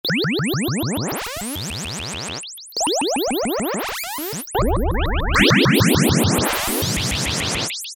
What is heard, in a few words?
LASER GUN, Yamaha DX7